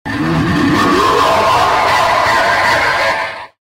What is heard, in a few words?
spawn; infernus